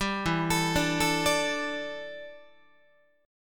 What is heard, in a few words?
E Minor 11th, Guitar; Em11 arpeggiated; JGuitar